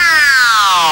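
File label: synth, Production Effects; Best Service - XXL Production Effects; SYN-FX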